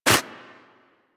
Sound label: Armadillo Dig Snow; Warframe; Hunting Critters; Foley